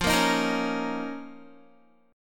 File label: F 7th Suspended 4th Sharp 5th F down Guitar; strummed